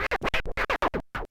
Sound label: SCRATCHES